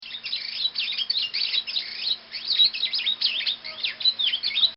Australian Frogmouth; Animals; Bobby Cole

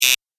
Tv Show Buzz In; electronic

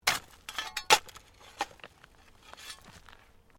Digging Shovel Mulch

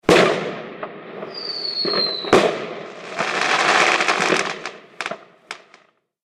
Fireworks Bang Scream Bang Fizz

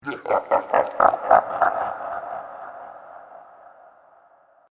Laughing Slime Toad, monster sound effect; Monster Sound Effects; Andrew Fleming

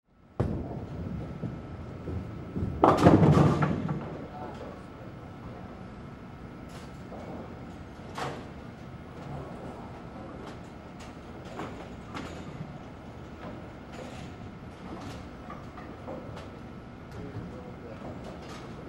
Bowling Alley; Sports; Blastwave

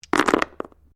Dice Small Game Board Throw; Variety of dice sounds; Casinos

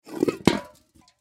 Soda Can Slide, Soda Can Full Slide Fall Over; Slides (Sound Effects:Objects); Foley; Blastwave

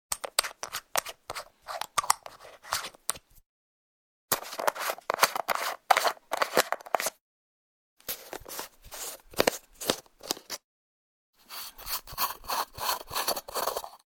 Unscrewing Jar Lid Pack, 4 x jar lids unscrewing; Twists; Objects

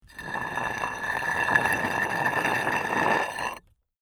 Stone Drag Rock Grind; A rock, cynder, brick or stone slab drags along an even rock surface; Rock Sound Effects

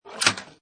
Pinball Sound Roll And Pass Metal Gate; Video Games

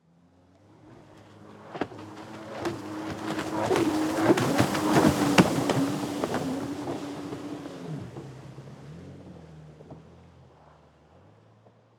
Jet Ski Pass By, Fast, Bounce; Transportation, Watercraft; BlastWave

